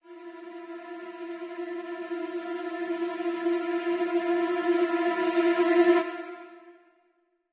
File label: Fear